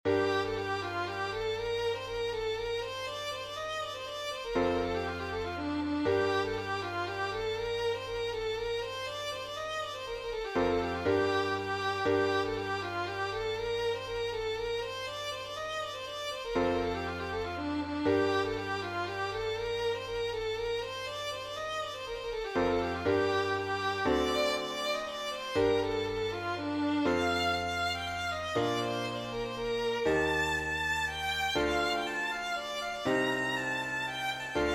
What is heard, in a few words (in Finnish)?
polska